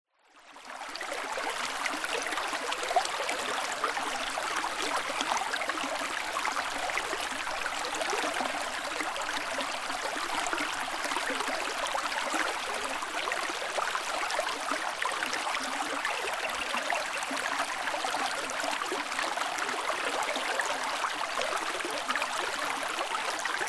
nature river shallow med flowing, Australia spring; mary river